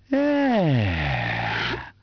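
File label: male happy; People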